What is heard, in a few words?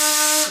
dog servo; Garry's Mod; hl2